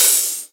Open HH, Cymbals

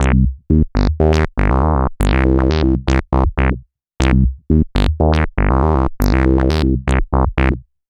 Bassline, Prototechno

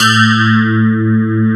sparkles

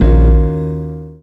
Lights Chord; M8

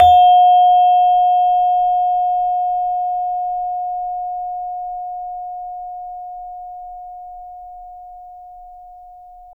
SARON F 3; Spectrasonics; Heart of Asia

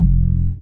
Single Acoustic Basses

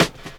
SD, HIP, Dance Mega Drums

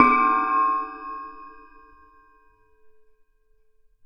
Shunt one shot, Ambient Metals; Sonic Foundry (Sony Creative Software) - Ambient Atmospheres & Rhythms

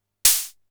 hh open, Polivoks; Formanta Radio Plant

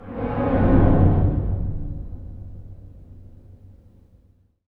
STR HIT, String FX; Roland - LA Composer vol. 2

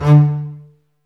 STR, Cb Bowed, Cb Marcato; Roland L-CD702

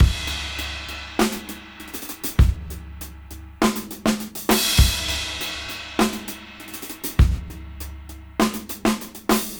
Half Funk 101 BPM; AMG - Keith LeBlanc Phat Butt Jams

